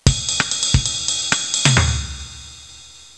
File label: Drum